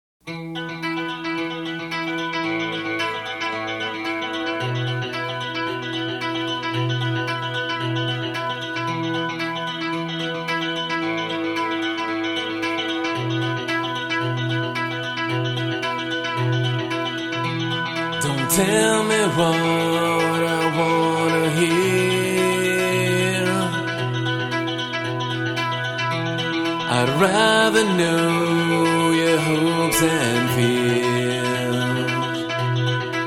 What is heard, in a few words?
radio songs; Comic Jumper: The Adventures of Captain Smiley; XBLA; X360